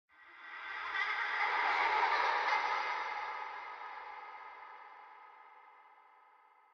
Creepy Giggle; silent hill homecoming 2008 gamerip pc ps3 x360

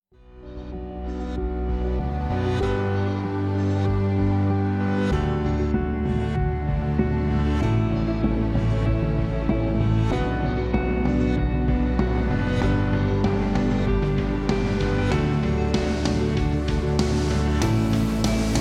Chance (Instrumental Ver.); Lord of Heroes; mobile

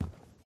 foam floor

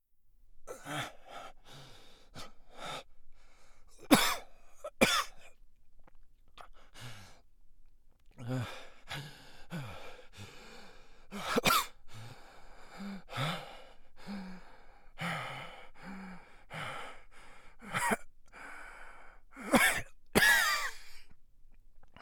Foley Breathing Ill

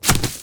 UI Item Looting; Inotia 3

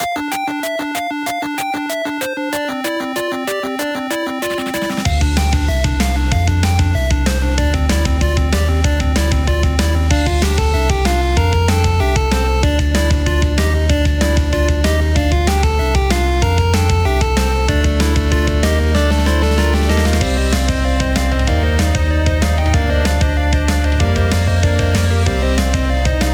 Boss Beats

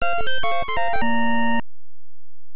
Round Music

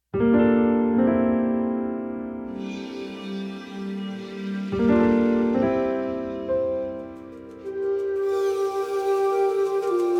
Village Night Theme; Frozen Flame; windows

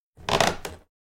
CREAK Wooden Horse

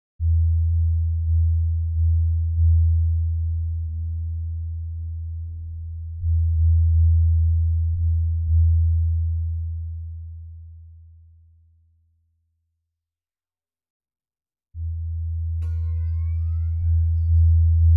Bed of Hum; Waking Mars

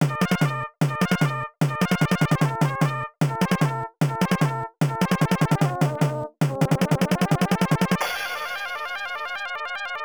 Bamboo Forest; zunzunkyou no yabou; system c 2; arcade

